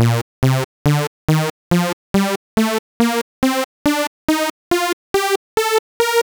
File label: Saw Scale with slight detune oscilators; wav2midi